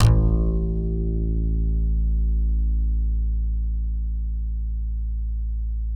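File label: acoustic bass slap A1, left; Masterbits CLIMAX 7 - Bass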